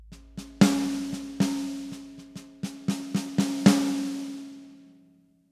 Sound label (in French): Caisse claire